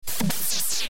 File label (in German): spacig schlagakzent